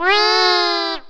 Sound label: BABY; BEAT2000